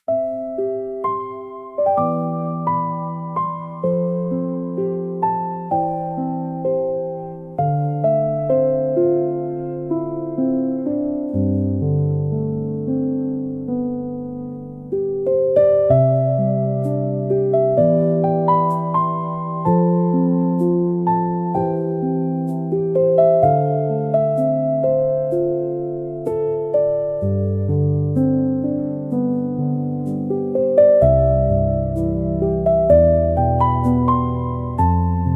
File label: fantasy music nature forest; ghibli style; healing; nostalgia